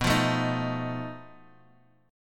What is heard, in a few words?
B 7th Flat 5th B down Guitar DADGAD